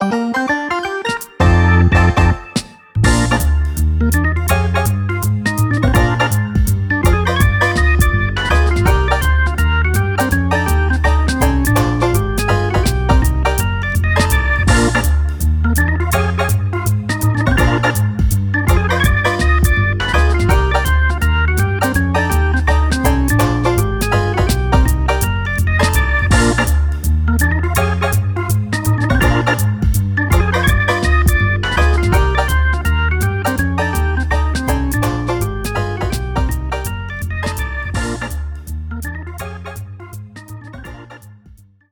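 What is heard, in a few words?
BGM Salon Result Win Table; clubhouse games 51 worldwide classics 2020 switch gamerip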